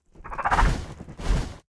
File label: queen walk, monster; moscutter